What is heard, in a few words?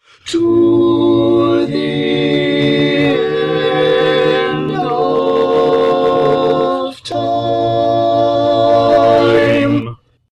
Tenor, barbershop